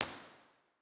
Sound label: bformat IR, holy trinity church; Position2; OPENAIR